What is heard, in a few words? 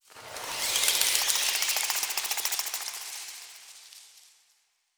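ambient bats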